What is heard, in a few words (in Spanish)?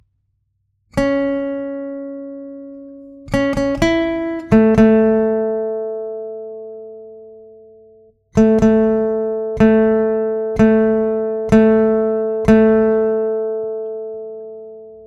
Himno Deportivo Universitario Cover Guitarra